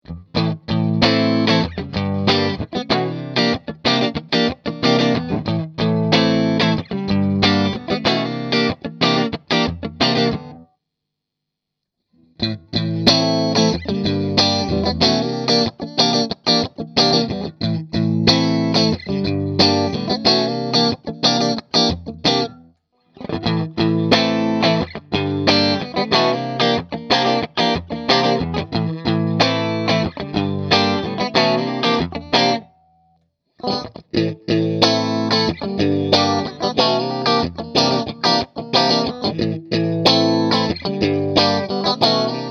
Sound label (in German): Strat Ohne Modulation